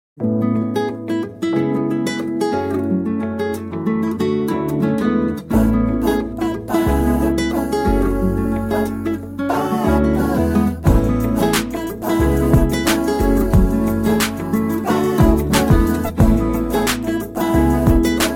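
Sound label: Guitar Instrumental rush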